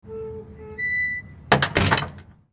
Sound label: Door